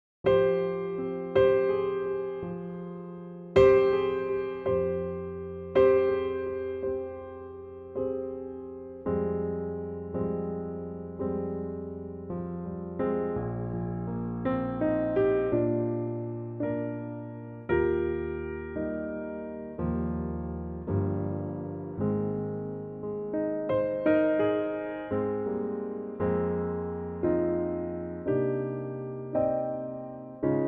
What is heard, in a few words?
piano trak